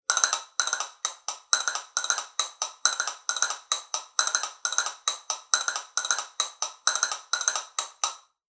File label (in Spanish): castañuelas; capricho; tela